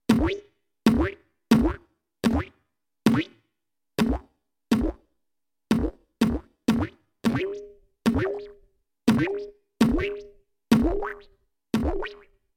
Cartoony Bounces, boings

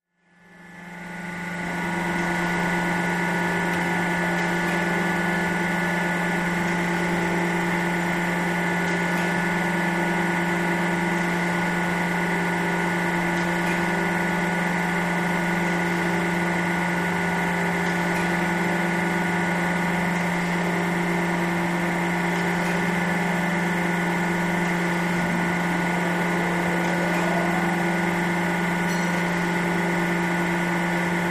Industrial Amb Factory

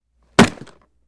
Football Catches

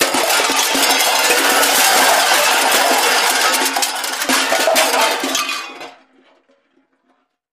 Junk Debris

